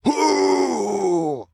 Sentry Fat Charge

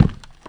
Foot Step Orc; Foot Step Orc3

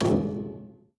Bump; Ion Shift

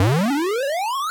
Special Photo Powerup Platformer Jump

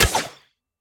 Wall trap attack; Folk Hero

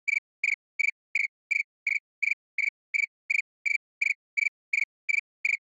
Env Bug Cicada Loop

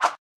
Item Gloves Move; In Internal Item Gloves Move

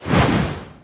Penetration attack

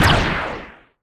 Homing Projectile; battle suit aces

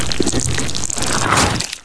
Big worm Attack

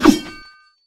Ghoul Sword Attack

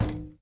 Footstep Glass

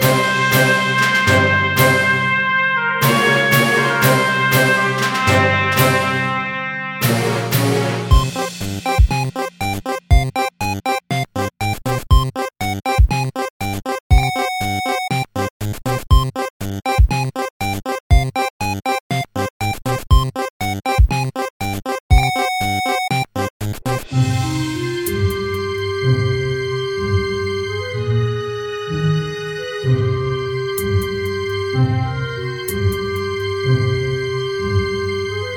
Song; The Henry Stickmin Collection

